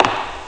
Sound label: step low; Amiga Soundtracker Sample Packs